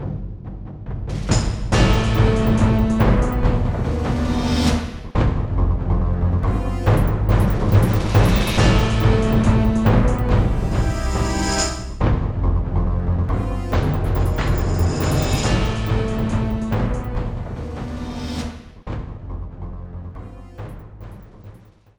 BGM Combat; District 4

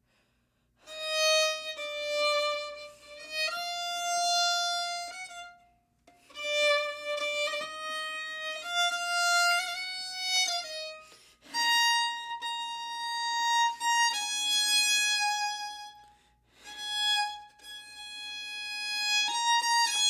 Violin; Assassin's Creed Syndicate

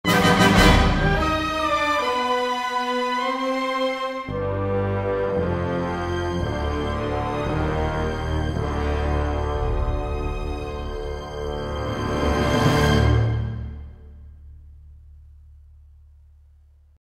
Foiled Again Sting; The Simpsons Game

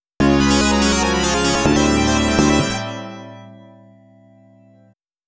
Area Cleared; バーニングフォース

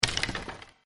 Bones; yet another pixel dungeon android

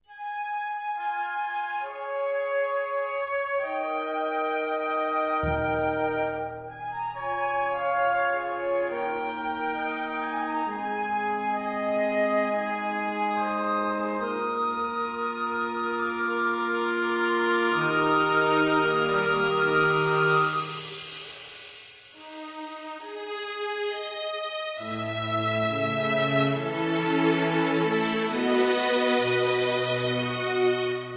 Ambient Theme

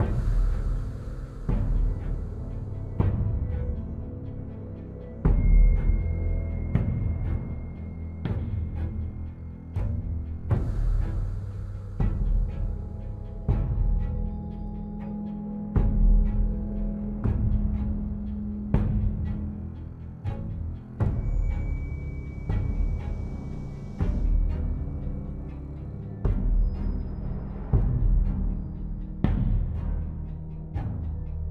Loop Tense; the walking dead michonne

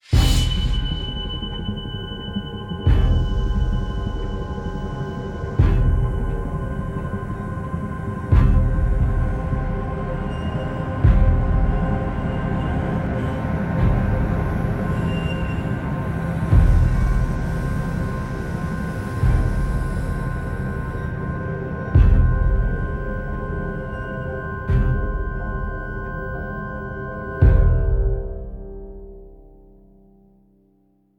Linear Tense; the walking dead michonne

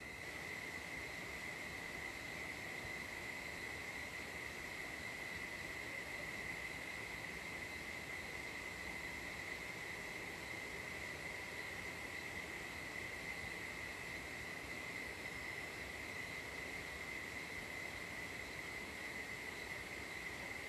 Ambient Cemetery; silent hill homecoming 2008 gamerip pc ps3 x360

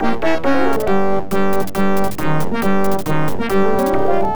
Imperial Fighter Arrives

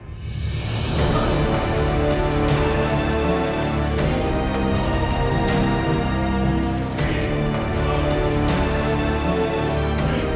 Menu Loop; God of War Ascension; PS3

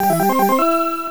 Power Up; Gomola Speed; PC Engine; Hyper Energy

